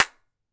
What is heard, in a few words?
Clap